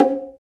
Bongo, Roland BK-5; Percussion

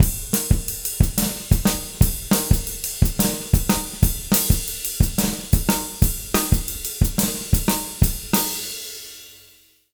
Jazz Latin Drumloops; USB Soundscan vol.08; AKAI

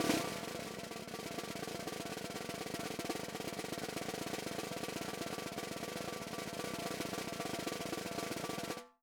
4X10 SOPRANO, Drums; Sampleheads; Peter Erskine Living Drums

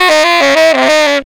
brass riffs & licks; Total Drum&Bass

